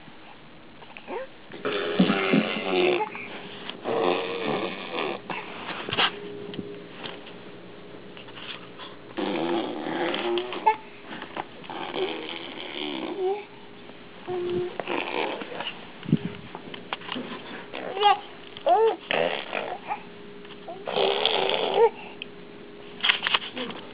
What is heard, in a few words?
bubbling